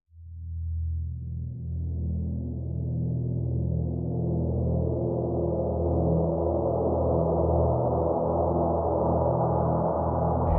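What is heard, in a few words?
Drone Wind Farm E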